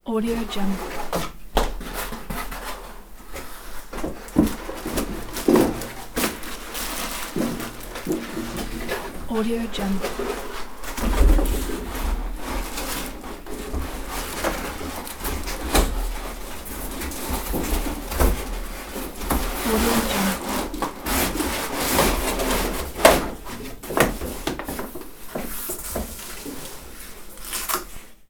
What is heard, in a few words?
searching noise